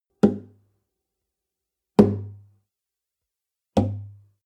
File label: nylon guitar drum single tonal